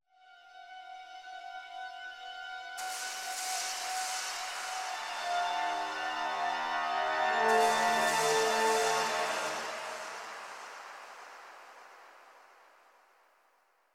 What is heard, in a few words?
reaper tunnel mood, music; inFamous; PS3